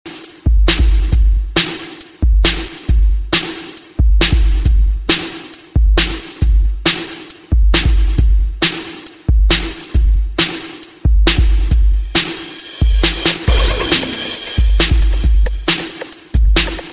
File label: best ever beats